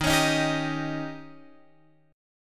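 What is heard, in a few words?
E flat 13th E flat, down, Guitar, Open F; strummed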